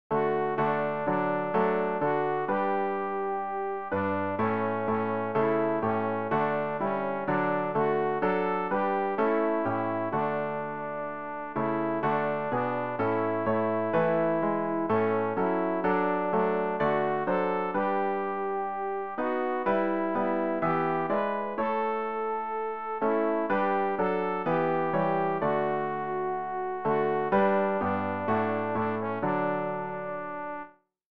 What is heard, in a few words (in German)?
alt, Übehilfen für das Erlernen von Liedern; ref gesangbuch; rg 239 gelobet sei der herr